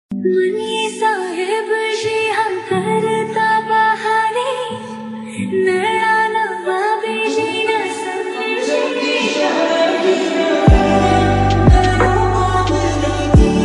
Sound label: Lofi Song